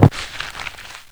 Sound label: bull paw; svencoop; hunger